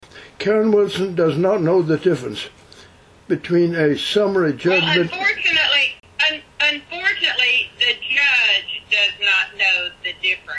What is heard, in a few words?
Drum